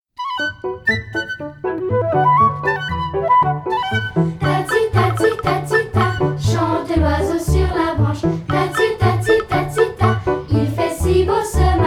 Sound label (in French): Deux oiseaux Version vocale